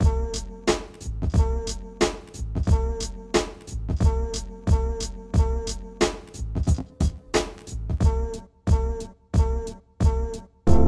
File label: pause menu